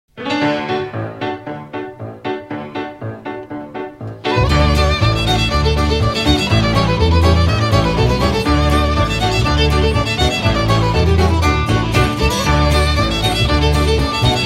hornpipe (instrumental)

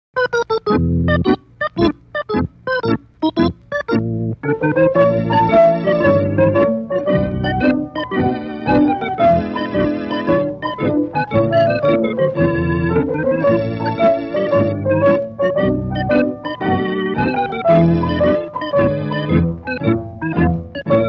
rustic dance (instrumental)

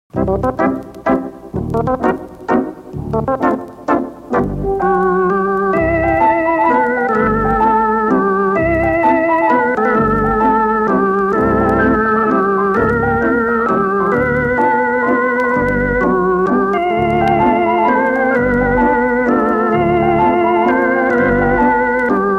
light in the window v.1 (instrumental)

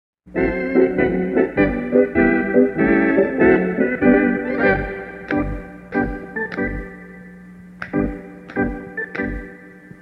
instrumental

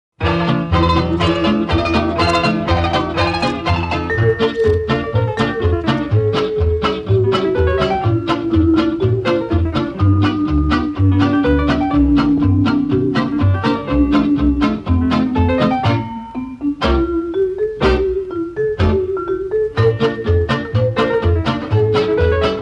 whisper ring whispering instrumental

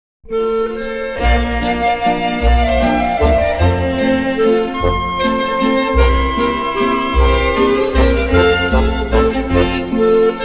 walzer, instrumental

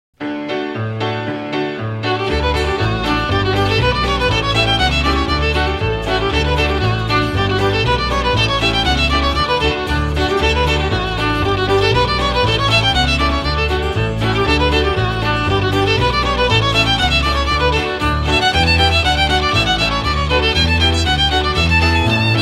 reel (instrumental); the golden keyboard

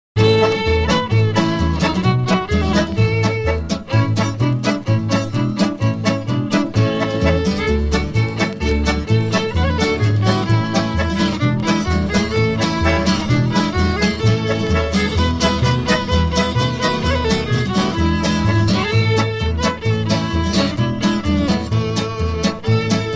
(instrumental)

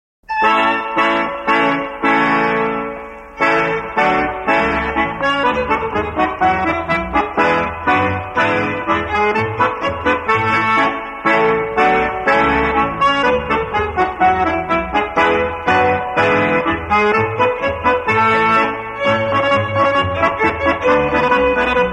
instrumental

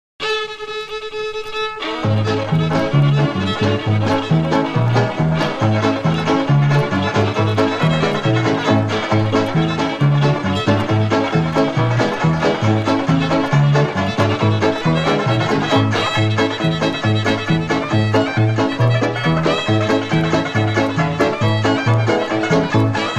hoedown, instrumental

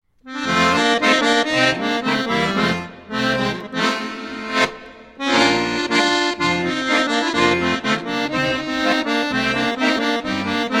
(instrumental)

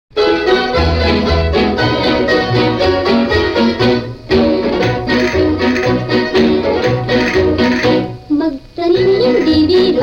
instrumental

